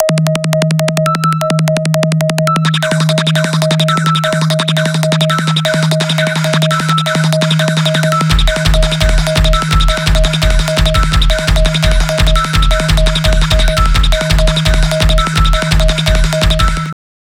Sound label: loops 170 bpm; Masterbits - Tekkno Trax